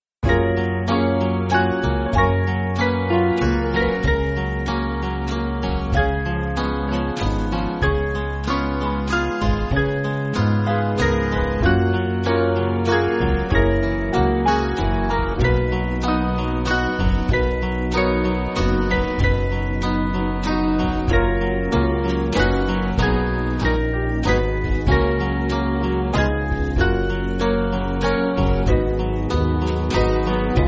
Band

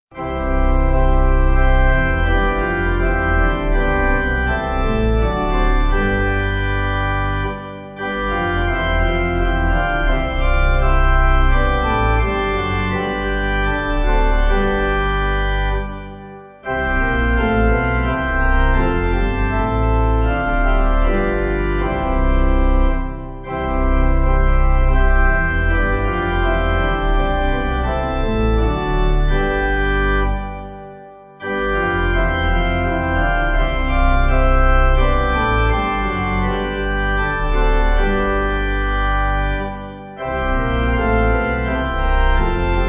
Sleepers Wake, Wachet Auf, Pipe; Bach

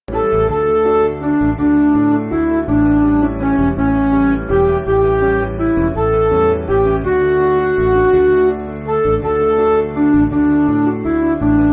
Midi

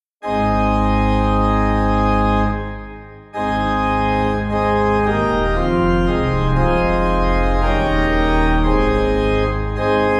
The Doxology Pipe

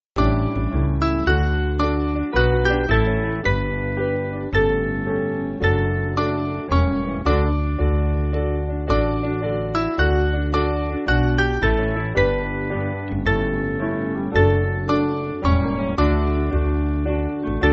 Monks Gate, Piano

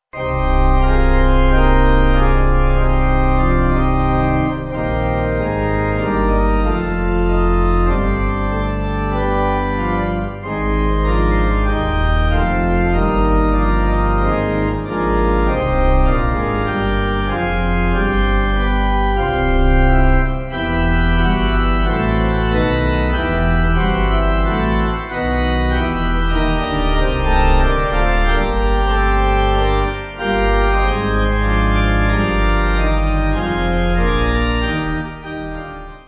Pipe

